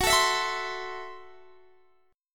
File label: F sharp Minor 7th Flat 5th F sharp Guitar Open D